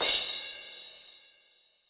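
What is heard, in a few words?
Brite Crash, Yamaha DTXpress Cymbal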